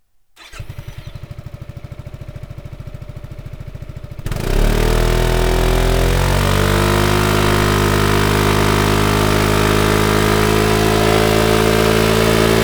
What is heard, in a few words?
Slip On YAMAHA XMAX 300, akrapovic; 2020